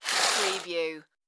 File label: curtain slide